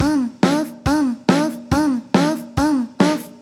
onoff 4th, syncopation

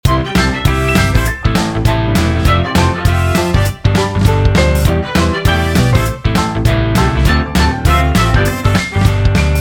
motown old

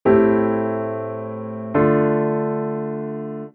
VIm7 IIm

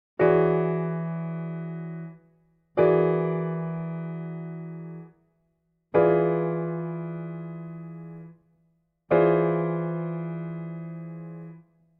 voicing variation dominant

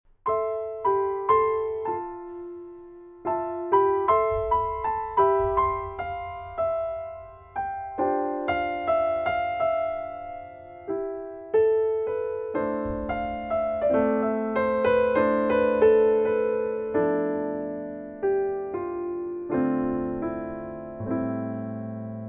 penta minor

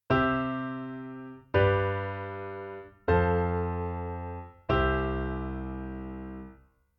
kadenz; chord functions

